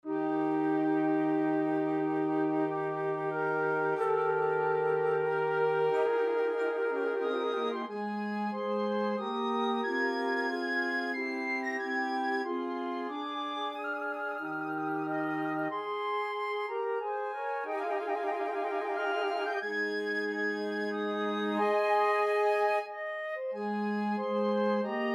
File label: flute choir